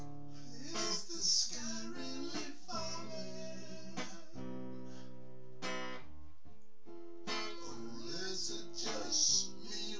nodrums synthconv

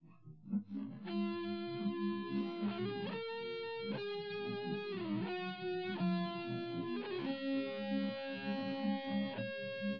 no drums inst sim; SASSEC07

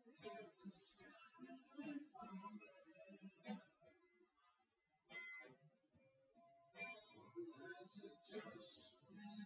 test nodrums synthconv 250ms 5cm src; SiSEC08; SiSEC underdetermined

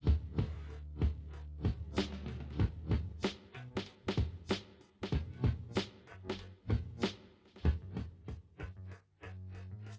wdrums inst sim

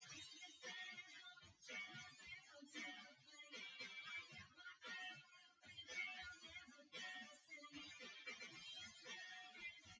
test wdrums synthconv 250ms 5cm src; SiSEC08; SiSEC underdetermined

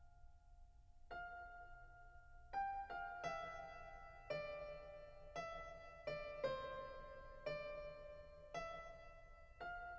nodrums liverec 250ms 1m sim

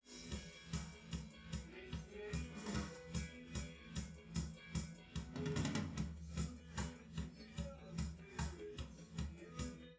drums live rec

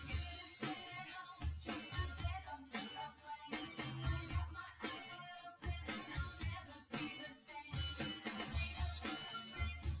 w drums synth conv 250ms 5cm sim; SiSEC08; SiSEC underdetermined

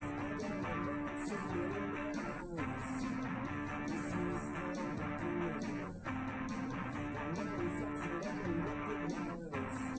test wdrums liverec 250ms 5cm sim; SiSEC08; SiSEC underdetermined

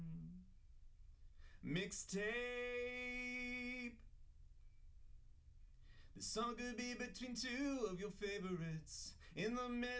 wdrums liverec

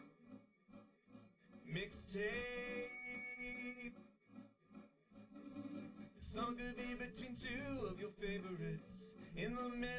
drums live rec 250ms 1m; SiSEC11; underdetermined

